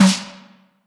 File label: snare lo; ExperimentalGear